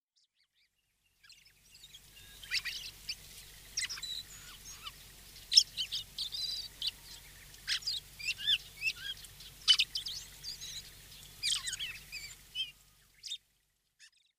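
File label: Dusky Thrush, nihonmatsu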